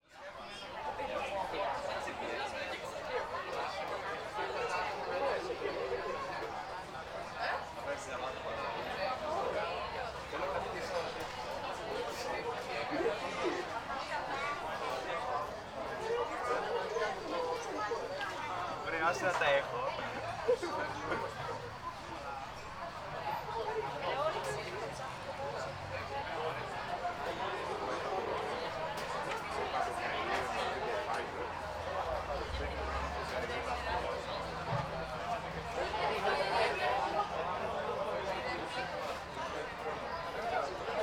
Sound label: Ambience Bar No Music